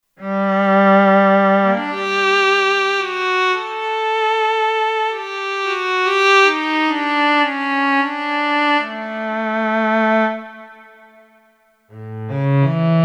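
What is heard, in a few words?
Slow Solo Cello, Kurzweil K2500X; Factory Programs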